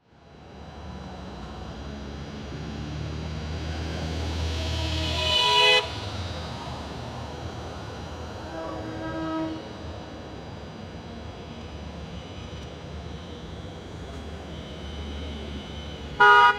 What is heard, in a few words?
car horns squealing of car, busy street